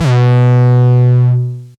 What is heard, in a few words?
808 Dist - B2, Kicks; Mbase 11 Kicks